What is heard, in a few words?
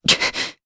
Vox Damage, jp; voices; heroes; Pavel; kingsraid